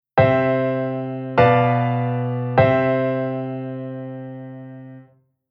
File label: Chord Cadence